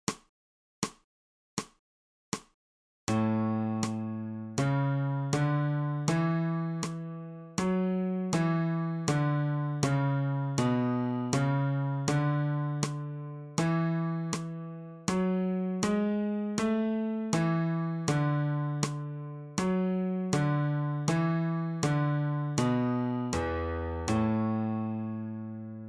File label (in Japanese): 新フレットレス